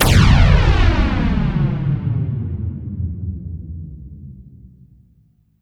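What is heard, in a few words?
FLANGE BLAST, WARHEADS; Spectrasonic Distorted Reality 1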